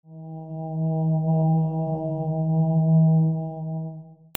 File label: MUSICAL